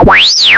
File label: Bat